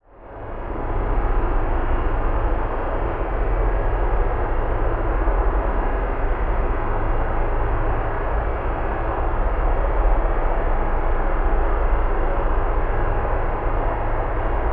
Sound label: Drone Space Crackle Rumble Distortion; Production Element Imaging; AdobeCC